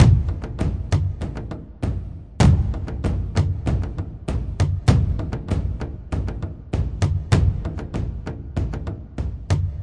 big native american drums, drum loops and breaks, 098 bpm; 091 to 100 bpm